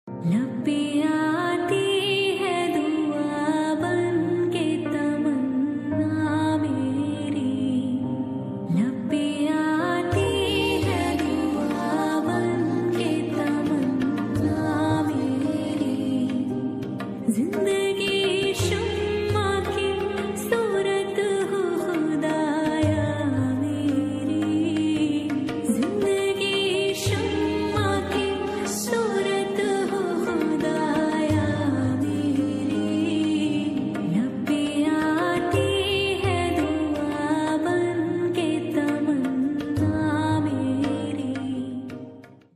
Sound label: Naat Sharif Islamic Ringtone